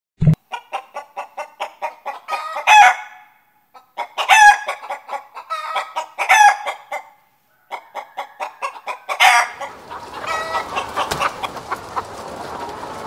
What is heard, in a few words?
hen sound effect